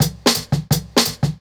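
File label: Jungle Breaks, 170 bpm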